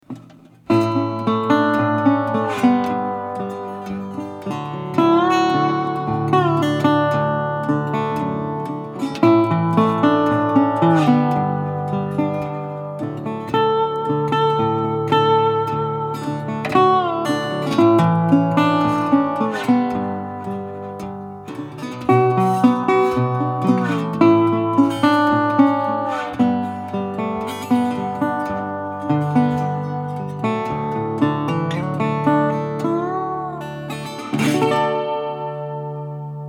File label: Steel Body; Delphi